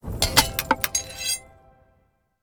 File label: Magical Enhance or Upgrade